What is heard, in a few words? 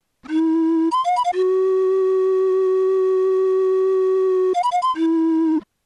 yell pan flute